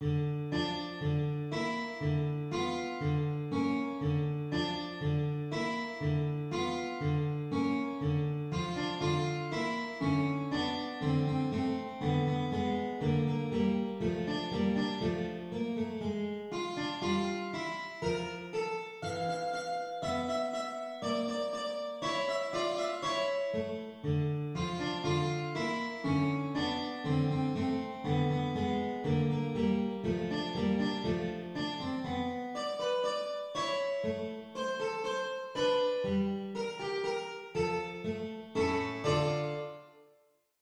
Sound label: sugar plum fairy guitar